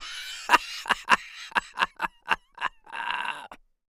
laugh evil male

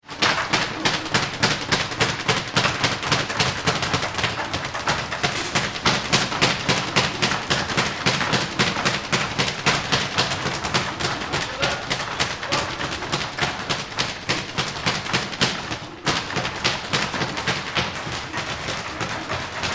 Boxing Speedbag Fast

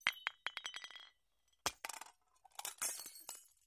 Light Bulb Breaks

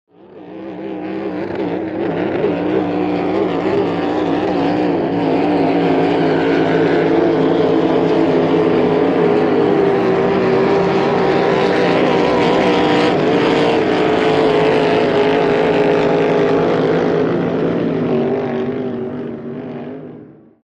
Hydroplane By Light

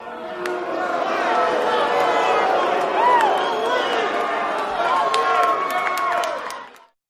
Crowd Boos Short Burst

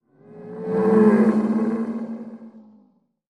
Animal Stinger Bull; stingers & whooshes

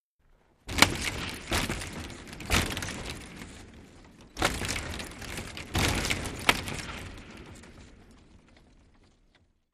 Hockey Glass Hit

